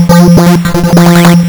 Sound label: lsdj waves; wavetables